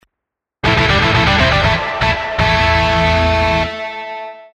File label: Jingle